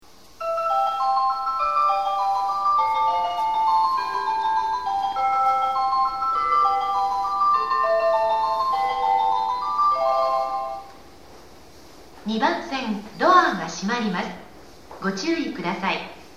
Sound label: tabata bell; Sonidos varios de la estación; VARIOS JAPÓN 2005 - MÚSICAS DE LOS TRENES